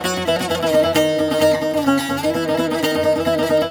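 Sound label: D#, bouzouki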